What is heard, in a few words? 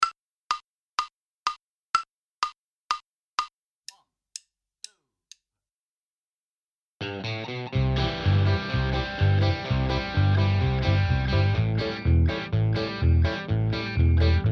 Minus Drums; Inst Ensemble